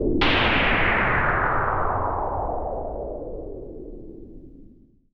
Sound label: SPACE FX 140; SpaceSounds